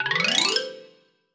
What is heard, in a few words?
Xylophone; musicradar; cartoon caper